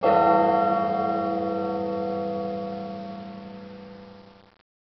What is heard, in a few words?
clock chime